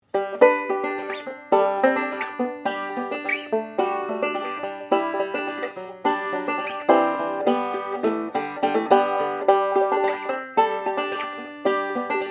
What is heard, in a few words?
mic test, banjo